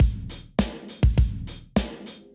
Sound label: Drums; simple session